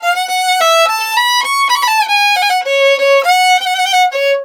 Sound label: VIOLIN, Balkanish Traditions